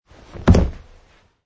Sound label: Landing